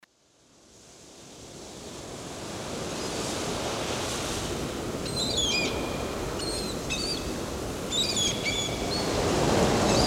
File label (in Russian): Zvuk morskogo priboya